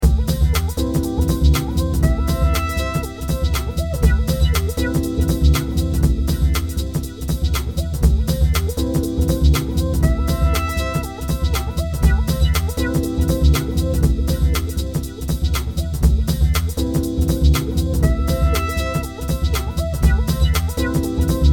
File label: intro music